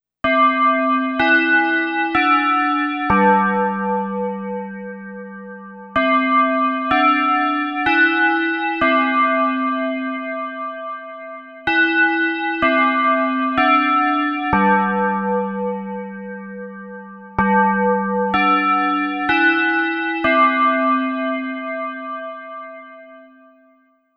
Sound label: chime